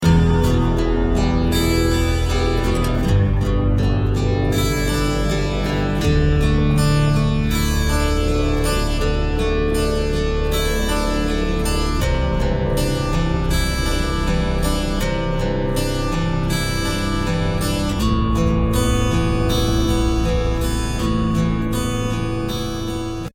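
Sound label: Romantic String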